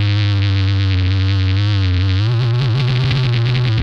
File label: FAT BEE, Funk Guitar; Zero G - Funk Guitar